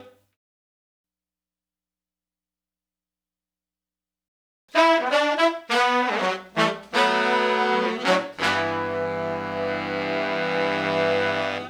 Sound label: Soul Horns, 70s Analog Funk; Sonic Foundry (Sony Creative Software); What it Is!